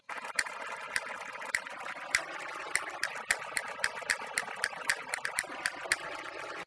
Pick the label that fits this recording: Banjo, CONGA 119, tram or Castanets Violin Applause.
Castanets Violin Applause